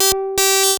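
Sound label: sample and hold G1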